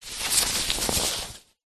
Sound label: mask fabric sleep eye handling; zapsplat